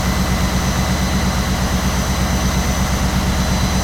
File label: Sonic Ferry Engine Loop